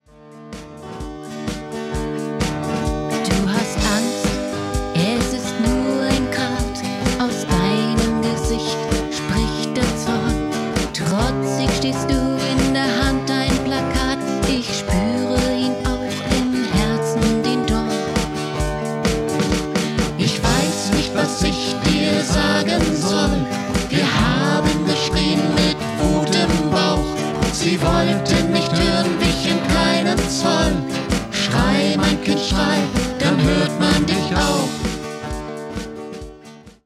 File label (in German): Schrei